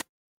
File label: ui hover